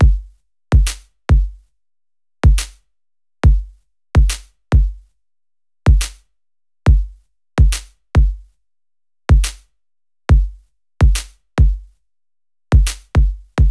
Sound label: Kick & Snare Loop 70Bpm, Lo-Fi; HipHop; Construction Kit; STEMS; Ghosthack; Ghosthack Advent Calendar - Day 4 - Lo-Fi Kit